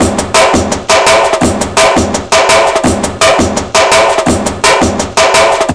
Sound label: BREAK, Explosive Jungle; USB Soundscan vol.05; AKAI; 90s sample CD